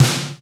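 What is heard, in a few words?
DEEP snare drum; Best Service Hyper Dance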